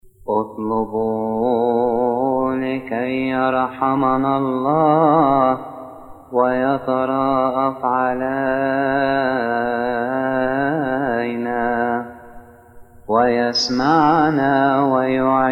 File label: tovh arabic, hymns, altar responses